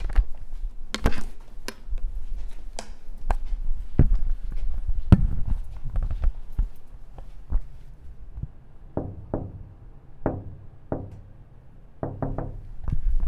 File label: Window Tapping